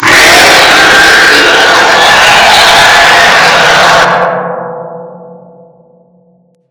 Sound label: jumpscare sound; the prophecy an endiess dream